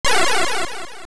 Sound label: Sword Beam; The sword beam; Link